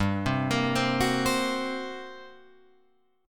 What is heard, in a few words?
G-Minor Major 7th Double Flat 5th-G-down-Guitar-Open F; arpeggiated; JGuitar